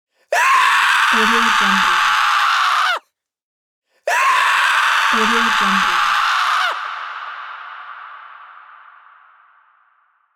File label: man scream